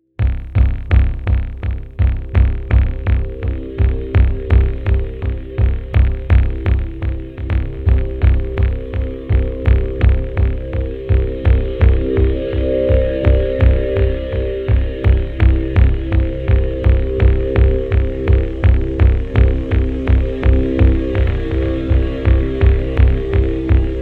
Electronic-Off-kilter-Unease-Machine-minimal instruments